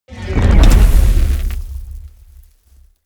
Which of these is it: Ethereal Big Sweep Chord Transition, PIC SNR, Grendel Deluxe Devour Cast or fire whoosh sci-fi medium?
fire whoosh sci-fi medium